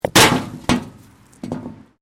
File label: Bricks Metal Movement; Material components for constructing more complex sounds